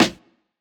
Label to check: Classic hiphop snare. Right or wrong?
Right